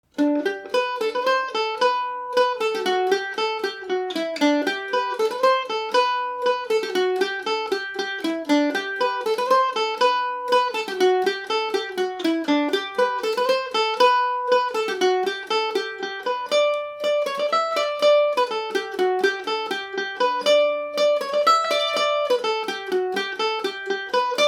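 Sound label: G major, irish mandolin; The Banks Of The Quay; G&O #34 mandolin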